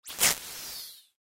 Glitch Electrical Shortage Fast, Glitch Distorted Beeps; glitches; Blastwave